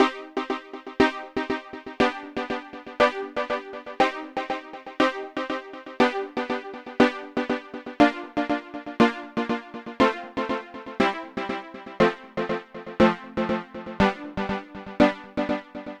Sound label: Echo Dance; Dr. Fink's Funk Factory